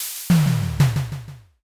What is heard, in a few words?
press